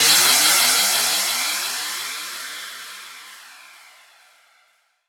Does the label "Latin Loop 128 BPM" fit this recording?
No